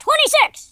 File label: oof; speech